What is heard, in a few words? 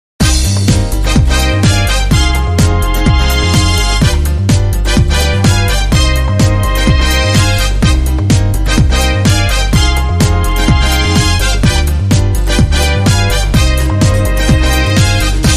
Cuban Dance No Vox